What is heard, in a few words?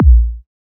kick